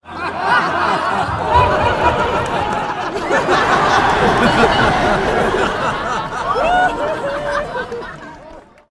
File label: AUDIENCE Hahaha